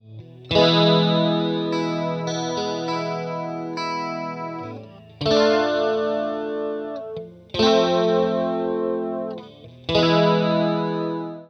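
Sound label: JC120 Stereo, Stereo Chorus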